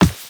Walk Default, Footsteps; Warframe; Operator

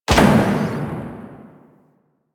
UI Menu Select; Warframe; Caliber Chicks minigame

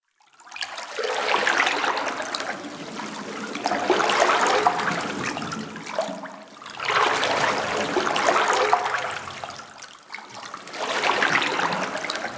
Idle Animation Slow Swim; Ambience; Fibonnaci

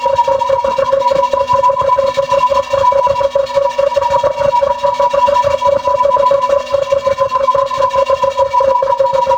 Heart Vault Platform Loop; Ambience; Warframe; Infested Eris

